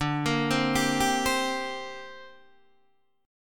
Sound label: D 7th Suspended 4th Sharp 5th down Guitar Open D